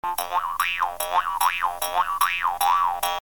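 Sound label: jaw harp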